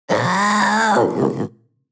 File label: animal panther young yelp; smartsoundfx